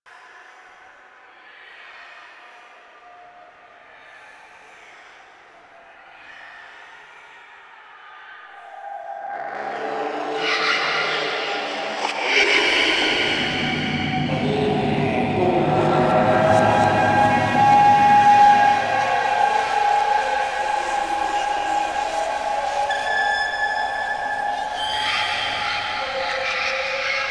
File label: Resource Pickup Loop; Warframe; Abyss Of Dagath